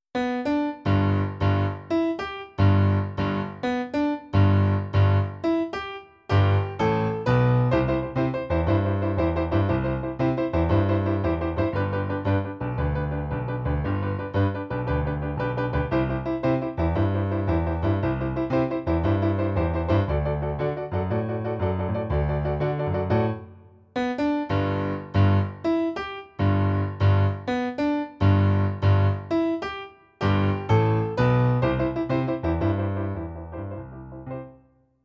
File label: Piano; piano tutorial